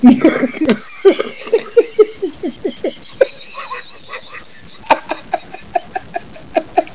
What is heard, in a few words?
laughing